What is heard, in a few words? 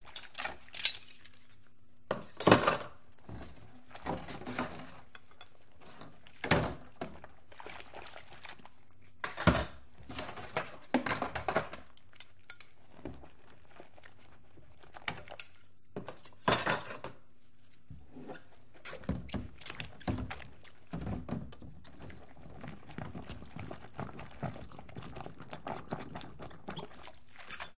household kitchen sink dishes cutlery washing water background hum